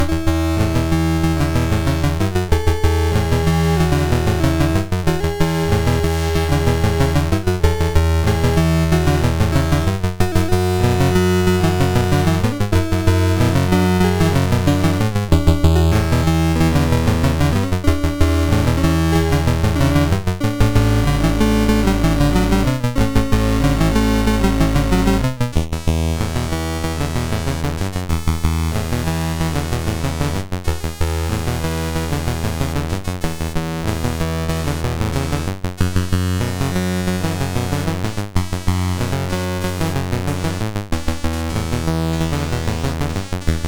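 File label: Magicians Ball, MOS 6581 SID chip music, games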